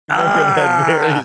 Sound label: aaaagghh